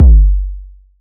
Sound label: big kickdrum sound g key